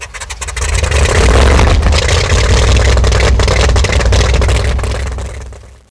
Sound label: start, F6F Hellcat; Bombable; flightgear